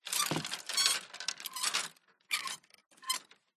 household bedroom built in wardrobe coathangers rummage clothes